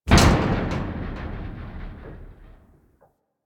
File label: Ship Hull Impact Small; Hit Effects; Warframe; Railjack Hull Hit Effects